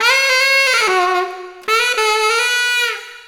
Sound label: Sax Smooth; Sonic Foundry (Sony Creative Software); Knutrix Drum & Bass